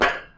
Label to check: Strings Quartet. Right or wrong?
Wrong